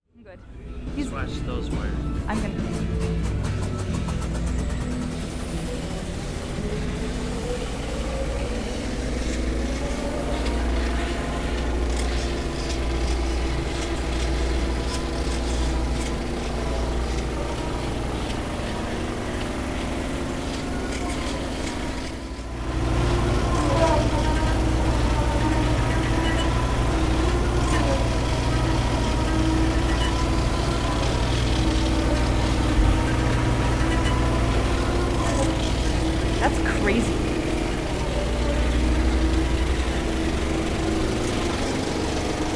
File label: IMAX projector start gears changing on platter; Soundscaping the Paramount; IMAX Projector Startup - Gears Changing on Platter